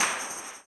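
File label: tambourine, 160; Spectrasonics; Vocal Planet; Blues Soul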